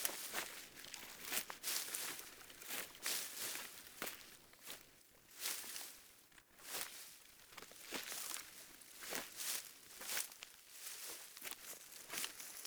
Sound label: Wolf Steps Jog Loop Vegetation; Animals